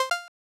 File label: Increase Stats